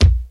original kick sound b key

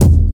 fresh loud kick drum sample e key; kick drum samples

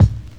raw bass drum single shot a key; kick drum samples

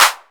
clap single shot f key; single hits one shots

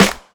clear clap sample g key; snare drum alternatives

